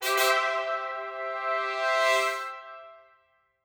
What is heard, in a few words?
Hypermaze Success Trumpet